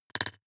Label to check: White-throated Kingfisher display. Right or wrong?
Wrong